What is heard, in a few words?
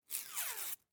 christmas present wrapping curling ribbon